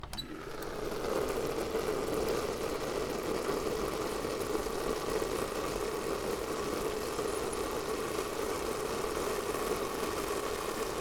Brewing

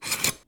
Sharpen

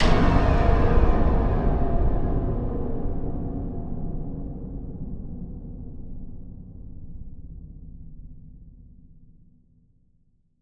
Horror Sting; Apollyon

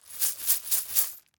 Coin Bag Jangling Shake

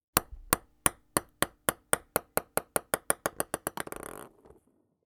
Ping Pong Ball

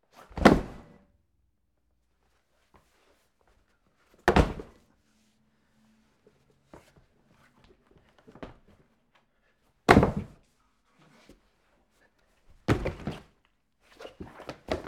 Wooden Floor Body Slams

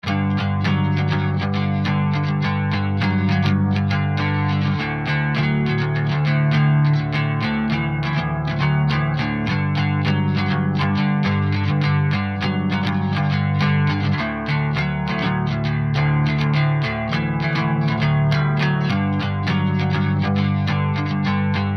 Midwest Clean Guitar

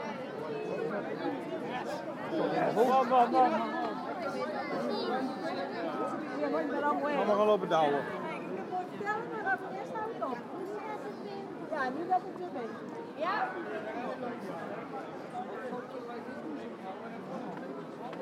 Crowd People Slow Street

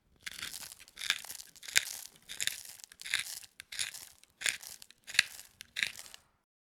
Pepper Mill

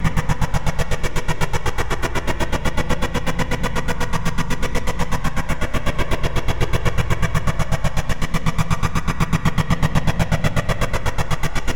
Phasing Chopper Simulation

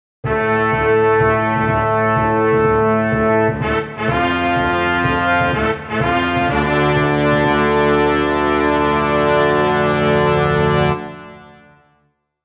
Fanfare

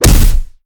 Style Punch; Indiana Jones

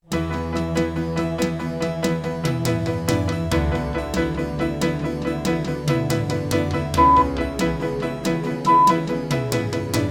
drums

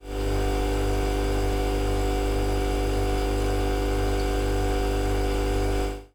Fridge

